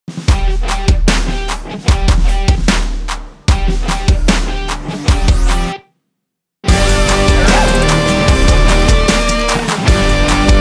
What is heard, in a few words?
HIP ROCK; SONOTON